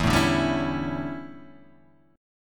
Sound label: D Major 7th Suspended 2nd Suspended 4th